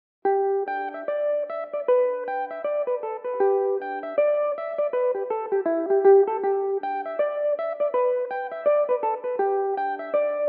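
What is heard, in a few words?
G major belmuse, irish mandolin; The Wigtown Fling; Belmuse EM-190 electric mandolin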